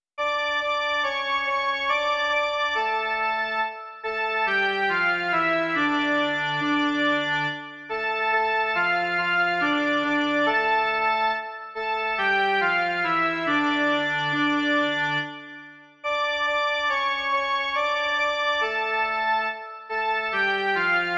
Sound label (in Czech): jen melodie; Všichni věrní křesťané